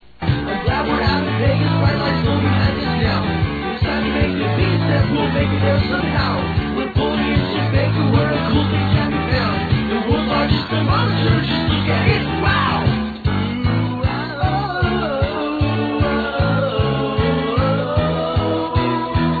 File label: ska song